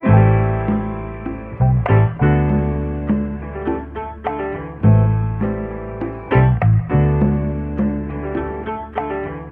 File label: piano, Loops; Phonky